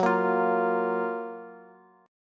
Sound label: F sharp Major 7th, Bass; strummed; JGuitar